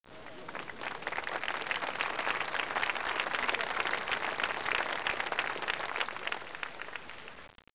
CLAPS

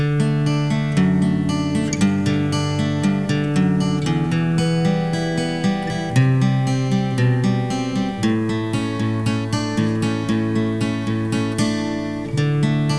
BG Music

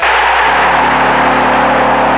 horn

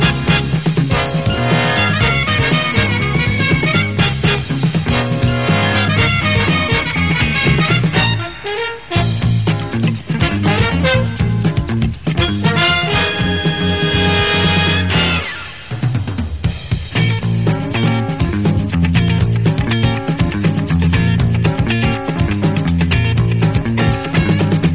scar horns

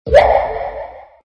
Macintosh Asterisk

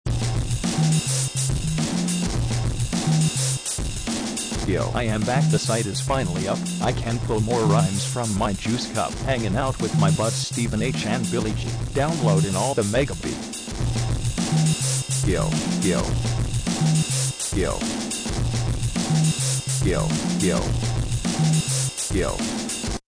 robo rap

